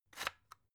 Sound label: electric whisk slider open; haus-garten; kueche